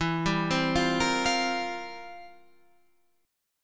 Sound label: C 11th E